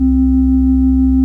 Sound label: ORG VOX Continental, Keys of the 60s and 70s; Roland LCDP09 Keys of the 60s and 70s 1